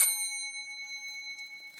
ding, Metro

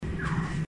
brake, Metro

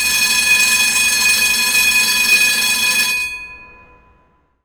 gong, long

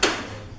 door Lock, Metro

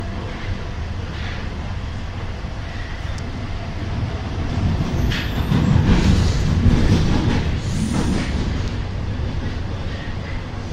fast, Metro

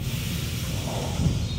mechanical brake, Metro

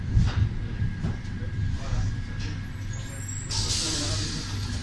stop, Metro

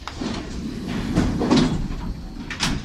door Close, Metro

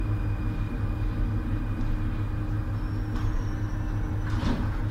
stop, Metro

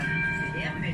ring, Metro

